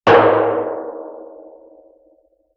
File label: Oil Drum Hit Med Sustain; Vintage Cartoon; Drums & Percussion